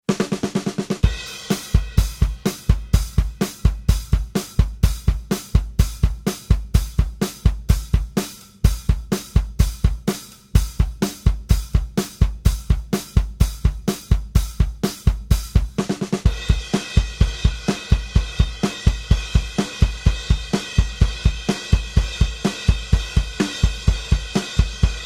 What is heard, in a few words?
70s pop rock